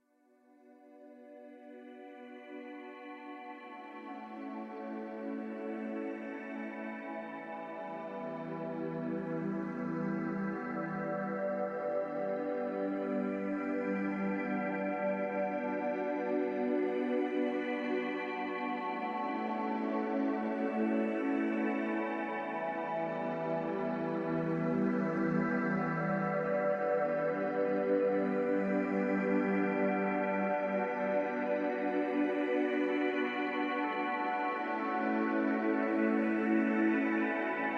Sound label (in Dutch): soundscape achtergrond toekomst muziek